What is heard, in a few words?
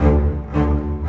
Rock Pop Bass Cello, Orchestral; Sonic Foundry (Sony Creative Software); Orchestral Series VOL-4 - Rock and Pop